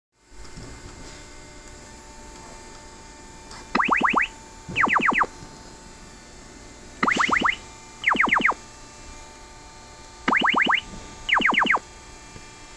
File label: Cisco IP Phone Laser